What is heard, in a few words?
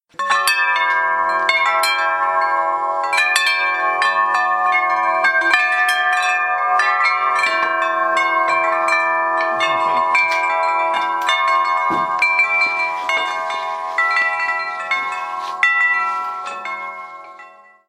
Wind Chimes